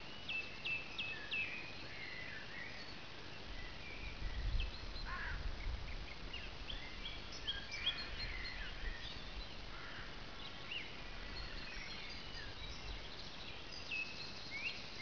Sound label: birdsong offwell valley devon